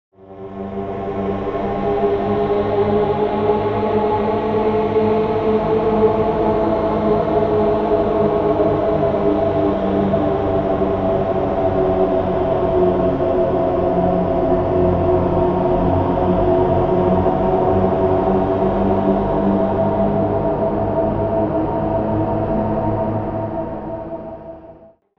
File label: haunted spac; porphyra hybrid by ocean swift synthesis